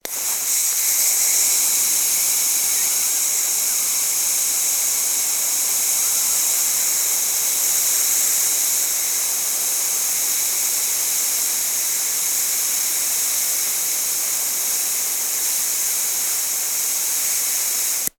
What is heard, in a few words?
foley inflatable air release from valve